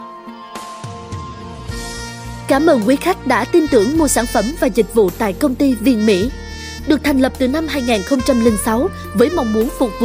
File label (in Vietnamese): Nhạc Chờ Doanh Nghiệp Spa